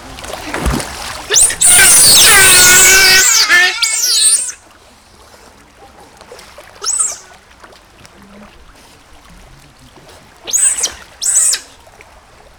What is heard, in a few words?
Pteronura brasiliensis group